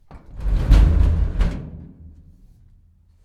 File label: Rolling Metal Cabinet